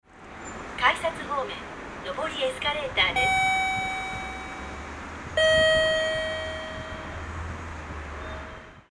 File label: station